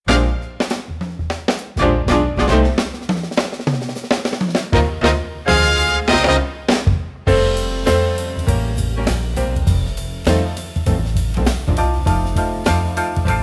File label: Jazz; QR Code